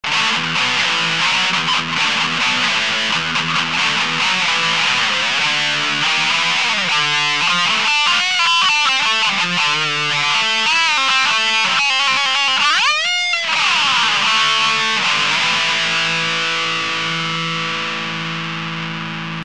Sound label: turbodrive